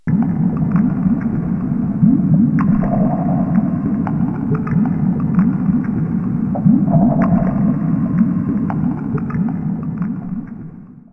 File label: cave